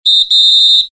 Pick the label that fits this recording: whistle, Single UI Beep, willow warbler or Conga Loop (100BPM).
whistle